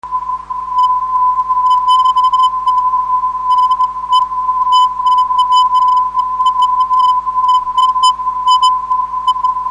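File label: US 1025kHz, non-directional beacon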